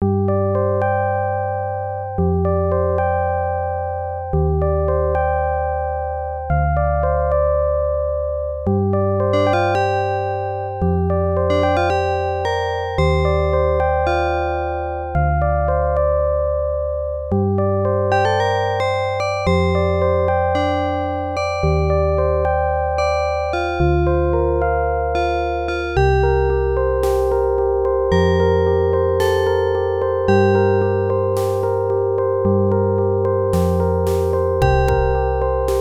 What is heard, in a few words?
slow groove opl; weeklybeats 2024